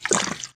consume item; action RPG game; Adventures in Lestoria; Sig Productions

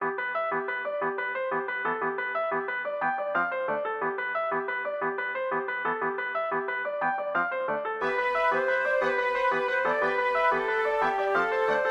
foresty boss; Adventures in Lestoria; action RPG game; Sig Productions

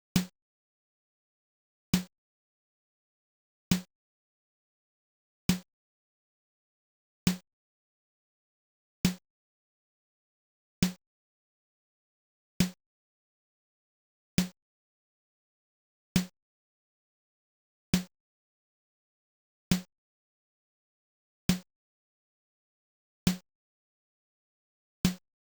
snare, music

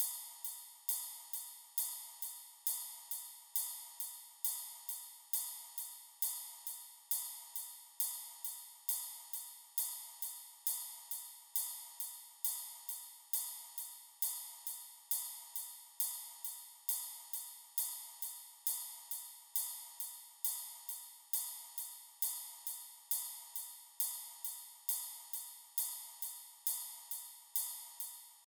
ride, music; action RPG game

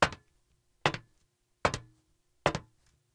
Foot steps; jmonkeyengine

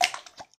slime dead; Adventures in Lestoria; action RPG game; Sig Productions

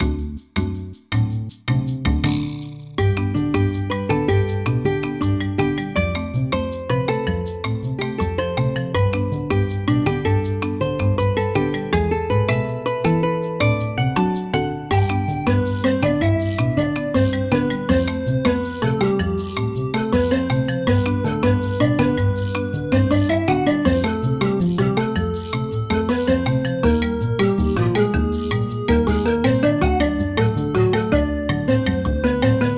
beach, music; action RPG game

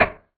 player hit; action RPG game; Adventures in Lestoria; Sig Productions

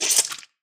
slime king shoot; Adventures in Lestoria; action RPG game; Sig Productions

